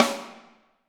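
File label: snare drum, Drums; Bob Clearmountain Drums I; SD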